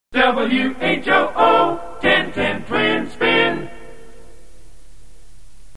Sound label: accapella jingle; twin spin